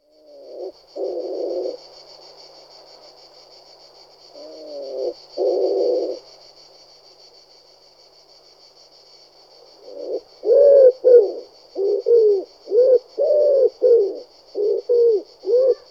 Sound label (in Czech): Holub hrivnac Columbia palumbus, nasiptaci